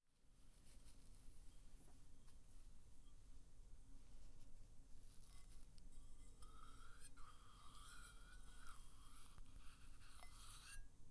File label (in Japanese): 泡